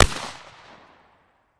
shotgun distant; Zomboid 41.78.16; Project Zomboid

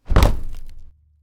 sledgehammer; Zomboid 41.78.16; Project Zomboid

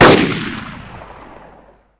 stormy Rifle Fire; Zomboid 41.78.16; Project Zomboid

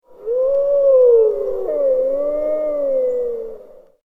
Wolf Howl; Zomboid 41.78.16; Project Zomboid

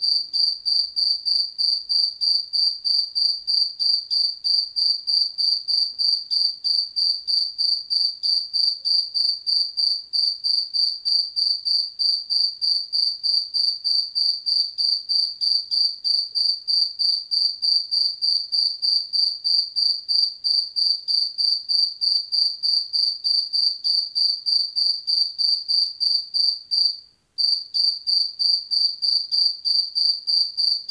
Cricket Single Close Strident; Zomboid 41.78.16; Project Zomboid

